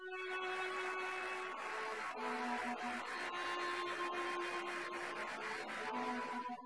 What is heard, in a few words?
Castanets Violin Applause